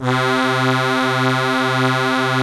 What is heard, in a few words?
ZUPAN DRUCK, AKKORDEON; Best Service; Alpine Volksmusik